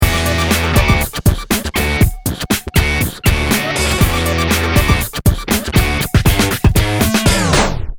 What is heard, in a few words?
speed down